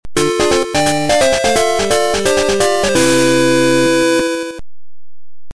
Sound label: game over; tiltandtumble